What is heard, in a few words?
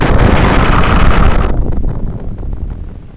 blast